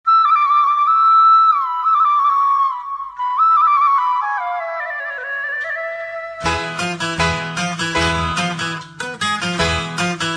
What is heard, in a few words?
Instrumental Very Old Song Tone